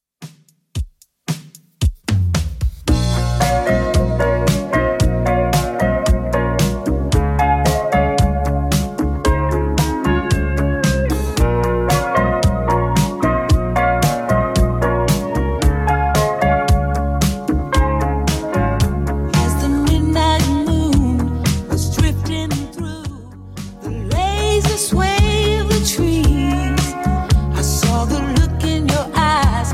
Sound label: 80s Redrum 113bpm